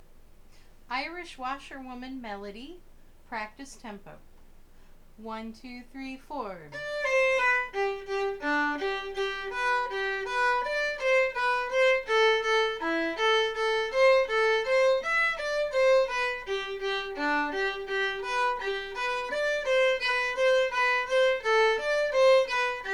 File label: practice tempo